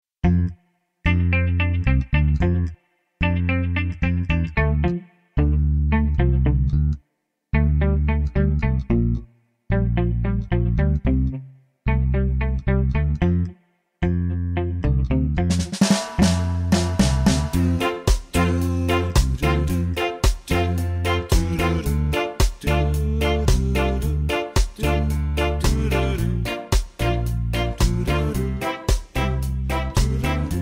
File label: Solo Ska Reggae Show